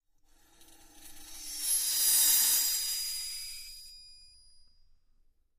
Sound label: Bells In Cymbal Bow, cymbal & gong; Mini Bells In Cymbol Bow